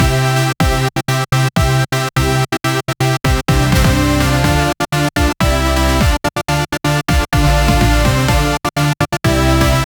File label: technological style moder